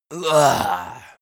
male die; koerpergeraeusche; menschen; soundpool

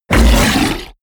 fishman roar attack short; kreaturen

